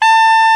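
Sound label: soprano hard A, soprano sax; Northstar - New Gold VOL-1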